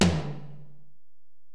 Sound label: Tom, Alesis DM-PRO, Drum Machines, Toms